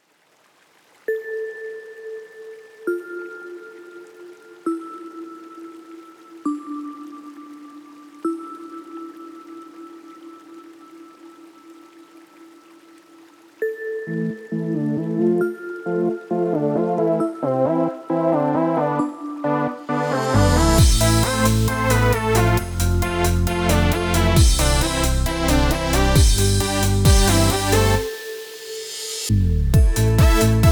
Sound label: (Instrumental)